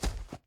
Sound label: Footsteps Dwarf Dirt; We Are the Dwarves